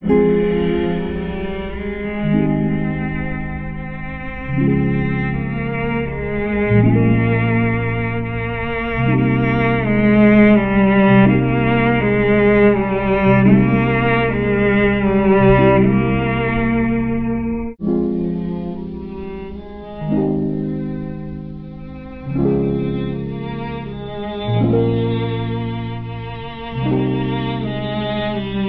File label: strings, sad loop